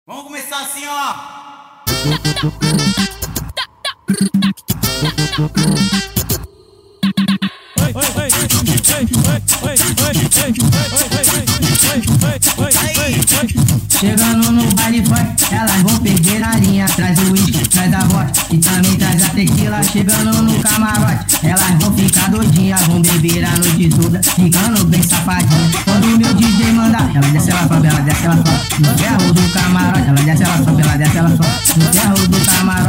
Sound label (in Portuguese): funk 150 bpm